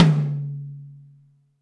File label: Tom Hi, Alesis DM5; Drums